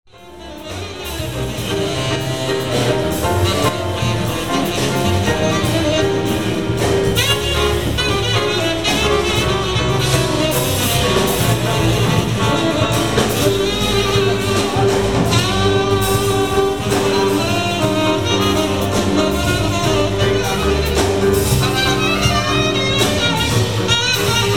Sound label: barga jazz